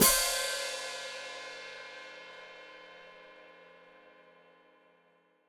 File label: Hat Closed Open, Hi-Hat